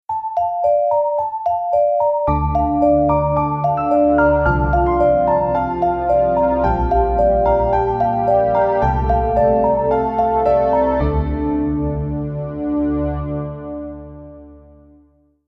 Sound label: Piano Puzzle; Decay; Decay - Part 2 - Piano Puzzle